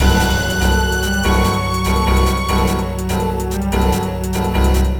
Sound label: BGM STAGE BATTLE; Phantasy Star Zero